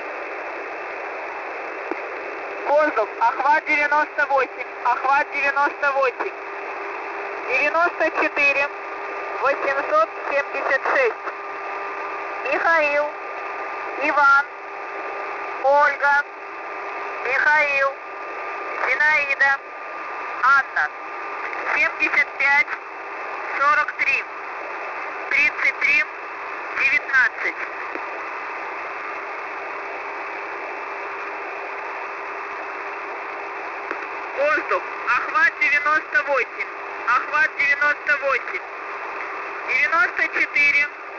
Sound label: usb msg; goose 4310 usb